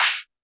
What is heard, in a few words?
Snare, Roland TD-20